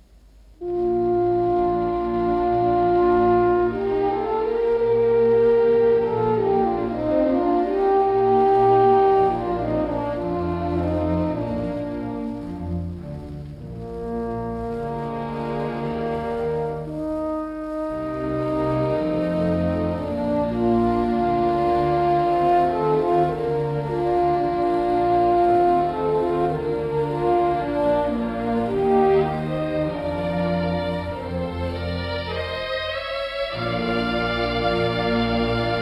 Mozart Horn Concerto No4

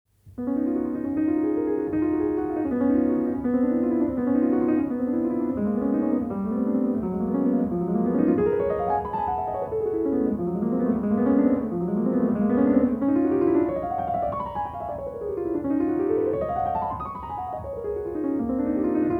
Etudes Livre1; Debussy; Ferber

